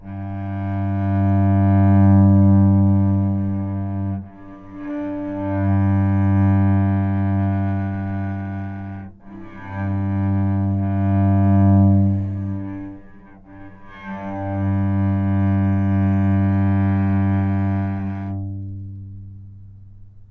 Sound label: G2 Sustain, Novice Cello